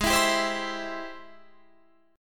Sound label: Ab Augmented Major 7th Ab Guitar Drop D